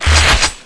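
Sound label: shotgun; Wanted; weapons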